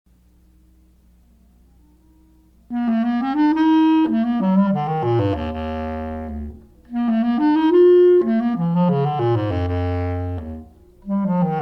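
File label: bass clarinet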